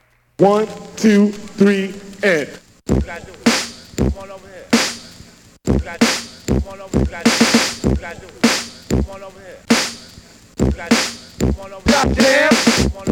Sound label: breaks; Super Duck Breaks